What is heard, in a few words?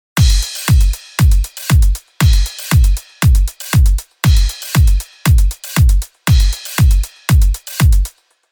Drum